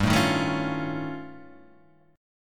D sharp 9th Flat 5th G, guitar

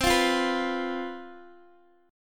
C Major 7th Suspended 4th, down, Guitar, Open D

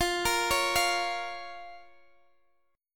F Suspended 4th Sharp 5th F down Guitar Open G

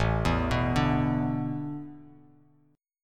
A Suspended 2nd Suspended 4th, Bass Standard

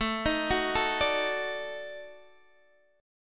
Eb Minor Major 7th Flat 5th

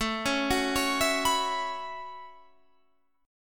A 6th Add 9th A down Guitar Standard; A6add9; arpeggiated

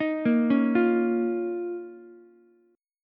F 7th Suspended 4th Sharp 5th F down Ukulele; arpeggiated; JGuitar

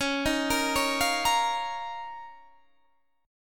F 7th Suspended 4th Sharp 5th; 21,18,20,18,18,18

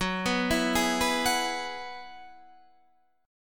F sharp 9th Suspended 4th F sharp Guitar Open D

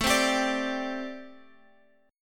A Minor 11th A, Chord

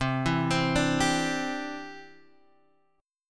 C-Major Add 9th, Guitar Half Step Down

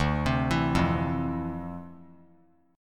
D Minor 9th, down; Dm9; arpeggiated

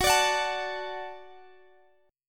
Gb Minor Sharp 5th, Guitar, Drop D; x,x,16,19,19,17; down; chord shape

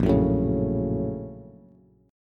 A sharp Minor Major 7th Sharp 5th, Bass

